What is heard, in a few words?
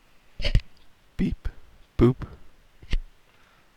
beep boop; Telecomm; testapps; android 9.0.0